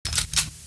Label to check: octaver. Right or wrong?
Wrong